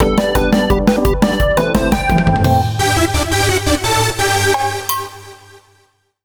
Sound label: jingle